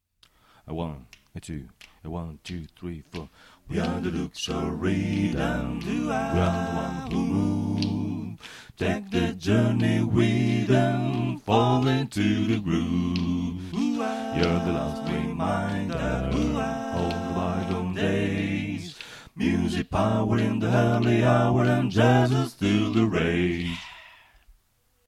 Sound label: bass